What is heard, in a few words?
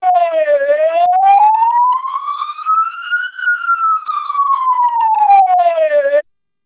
siren